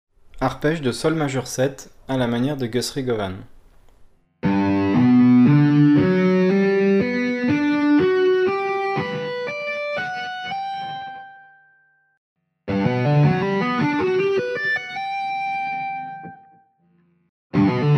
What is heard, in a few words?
lick